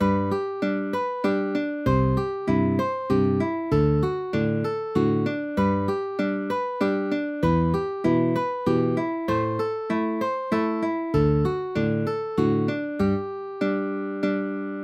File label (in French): suite accords, harmonie